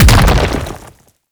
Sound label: Hand Bomber Proj By Explosion; Warframe; ManInTheWall